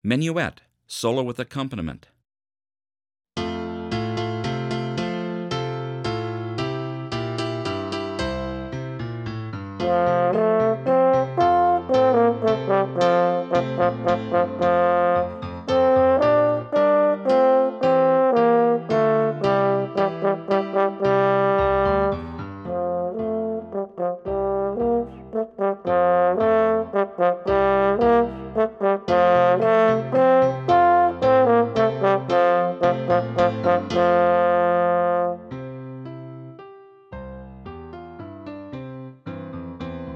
Horn Solo With Accompaniment; French Horn Solo with Piano Accompaniment – Performance Tempo; Menuett

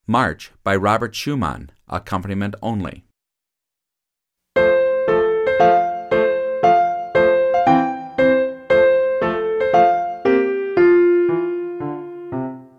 Horn March Accomp only

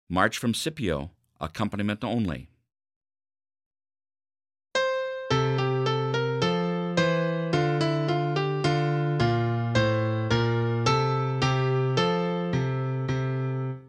Horn March Accomp only; March from Scipio

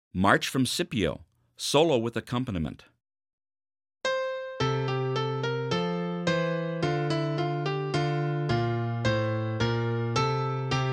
Horn March Solo and Accomp; Horn with Piano Accompaniment; March from Scipio

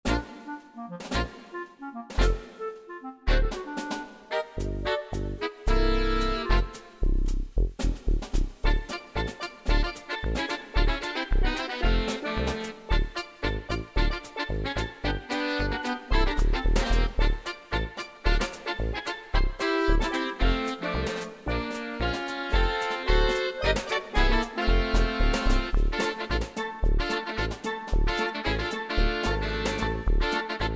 Band Play Along

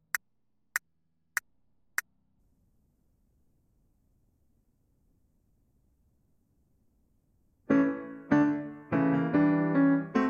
In Autumn Accomp Only

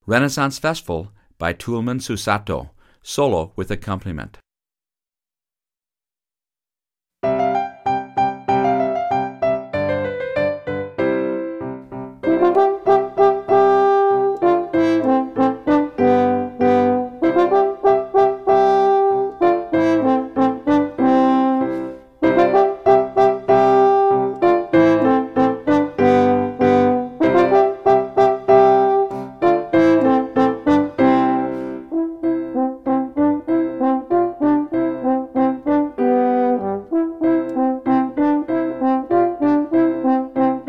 Horn Solo and Accomp; French Horn Solo with Piano Accompaniment – Performance Tempo

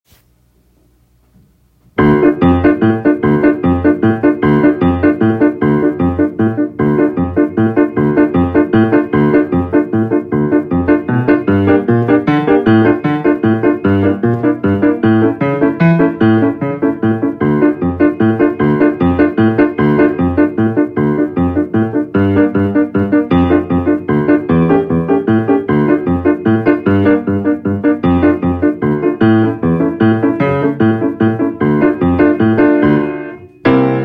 Fandango at 152 bpm; Piano Accompaniment Only

